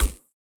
ball bounce shock; CrossCode; battle

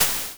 pickup heart

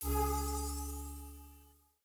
spot touch; CrossCode; puzzle

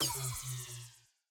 highlight switch

